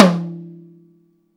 TOM, Drum Dry; East Collexion; Drum 1

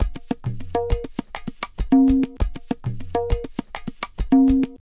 Tabla, 100 BPM; Zero-G; Pure Tabla

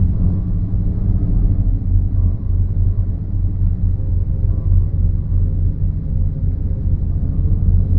Drone Ambient; Drums & Drones

